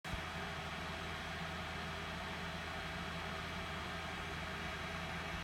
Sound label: Aopen qf12